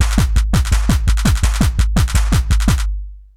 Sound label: Lo-Fi, Essential beats; FM 57 - The Ultimate Sample Collection (1997)